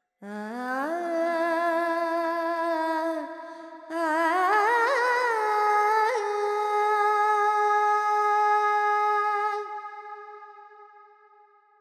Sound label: FX Phrygian, Carnatic Female Alaps; Sample Banks